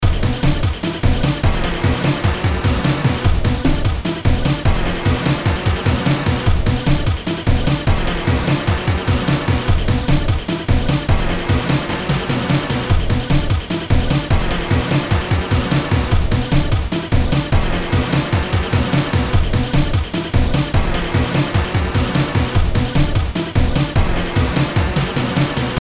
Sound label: Hit; Terminal Velocity